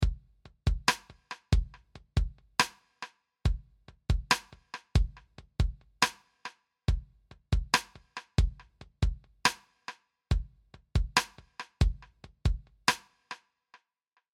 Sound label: Loop Delayed